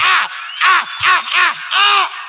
"ah ah ah ah ah"; Voice; ooh ahh

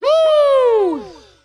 "woo"

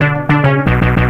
electro, Loops

